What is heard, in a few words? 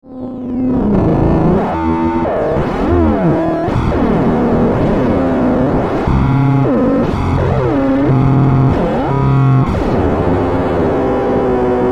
up knob bender, robot; deathbyaudio